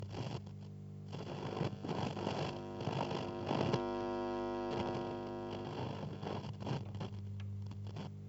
verstellen Der Lautstaerke; Radio

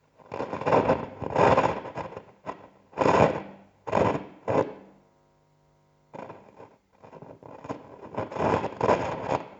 krachen bei Abstimmung; Radio

